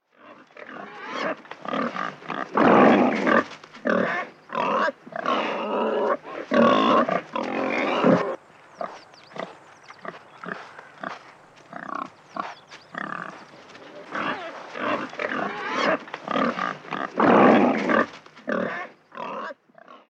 Wildschwein Sus scrofa

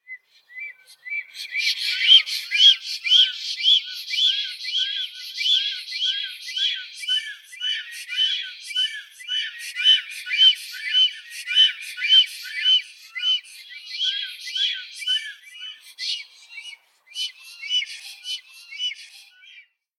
Schwarzstorch Ciconia nigra

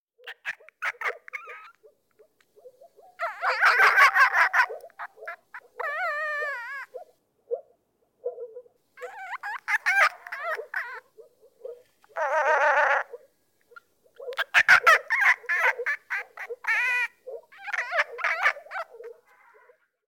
Marderhund Nyctereutes procyonoides; Hinsichtlich seiner jagdlichen Stellung hat der Marderhund eine ganzjährige Jagdzeit.

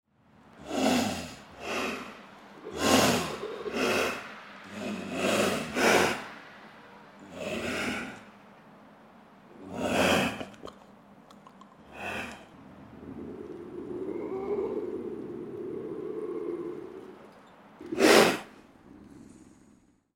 Luchs Lynx lynx

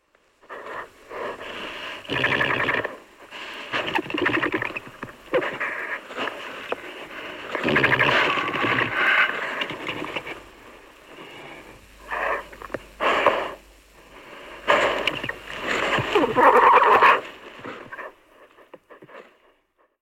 Dachs Meles meles Paarung

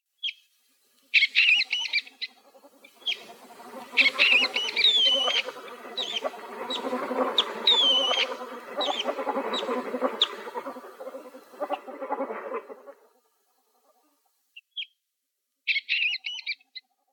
Amerikanischer Nerz Mink Neovison vison; Eine Bejagung des Minks ist aus Gründen des Jagdschutzes ganzjährig möglich.

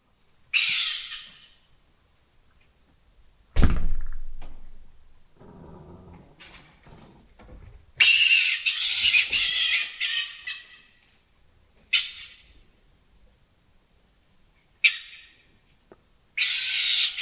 Steinmarder Martes foina; Gelegentlich bricht er in Geflügelställe oder Taubenschläge ein.

